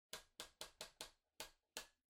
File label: Electric Fan Far Height